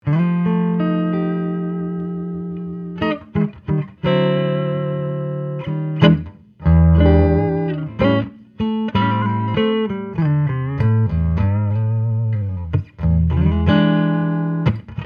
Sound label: prs hollowbody i archtop, archtop guitars electric; paul reed smith